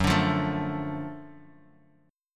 Gb Minor Major 7th Sharp 5th, chord; strummed; 14,17,15,14; JGuitar

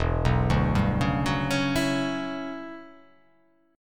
E Minor 13th chord

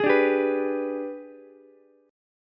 Minor Major 7th Flat 5th, Ukulele; G#mM7b5 strummed; JGuitar

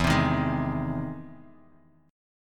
E Augmented Major 7th E down Bass Standard

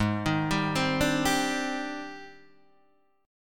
Ab Major Sharp 11th Ab down Guitar Open D

